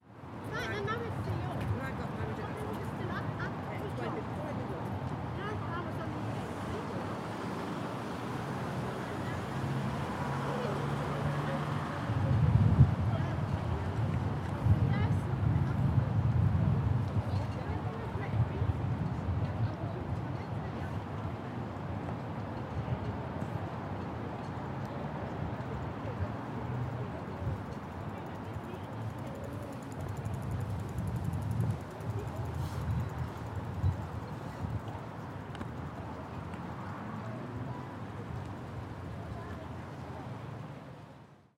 hull marina walking conversation passing bicycle clicks background street noise